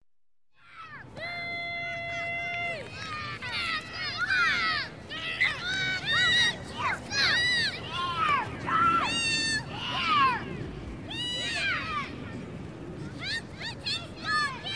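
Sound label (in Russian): children play football, shouts, coach's whistle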